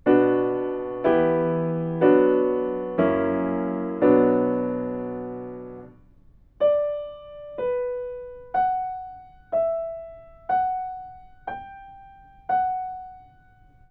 melodic fragments; ear training

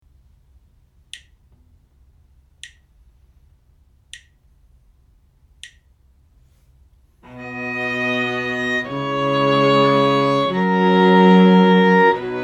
harmonic dictation

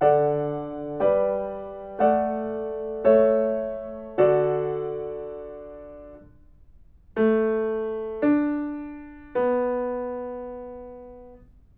melodic fragments; eartraining

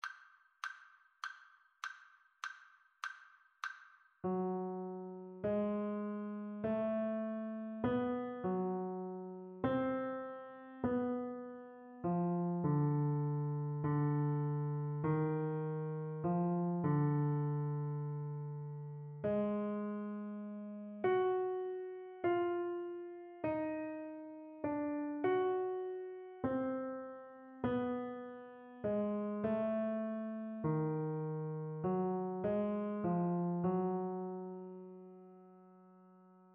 melodic dictation; ear training

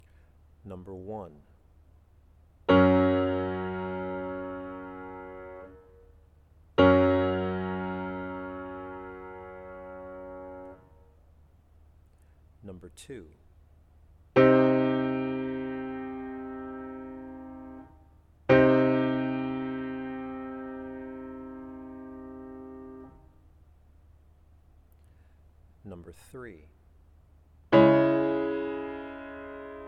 chords; eartraining

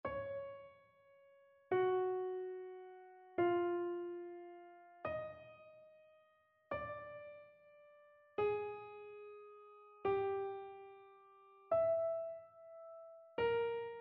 row dictation; ear training

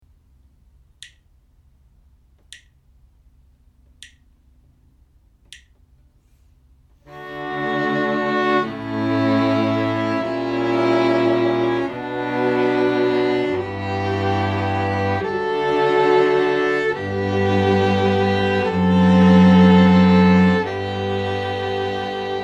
harmonic dictation

